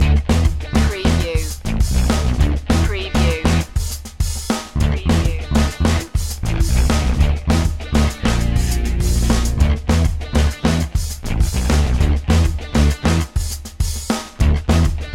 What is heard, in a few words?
funk side loop